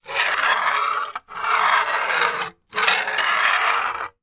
foley glass piece thick broken movement on another; zapsplat